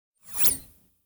Button Thin Turn On Off Button; Hollywood Edge; sound designer's toolkit v2

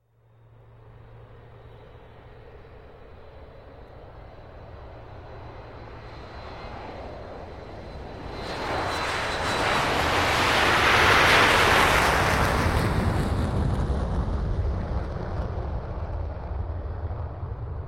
Large Jet Take Off; Sound Ideas; Series 2000

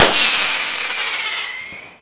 firework single; best service Blue Box

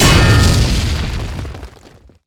Explo Fire Extinguisher; Destruction

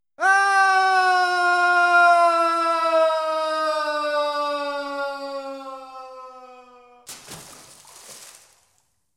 Falling Down Well; 1001 Sound Effects; Halloween Horror Sounds Effects

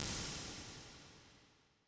BIG HALL, impulse responses

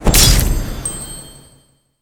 Enemy Defeated; Kilta

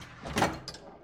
Pinball Ball Drop From Slide

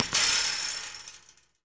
Street Ball Metal Rim Chain, Street Basketball; SFX Kit

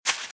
Velociraptor - Footstep - Grass - Run - Right

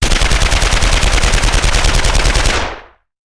Machine Gun; Sound Ideas; Guns

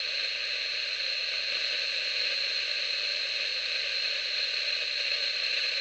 walkie-talkie static; devices accessories utilities; NS Studios - ultra sound collection

